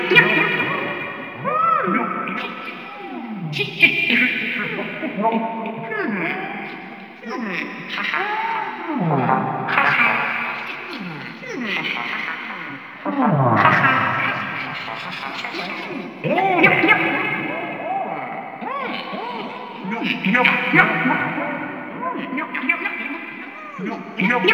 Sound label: Vent To Colony Loop; Warframe; Nokko Colony; Vendors